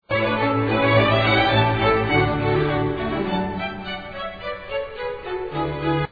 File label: minuet